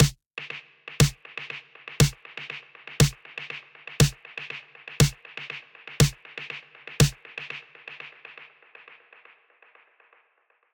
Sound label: Snare; feedback echo plugin; Newfangled Audio; Preset: It’s Ending One Minute At A Time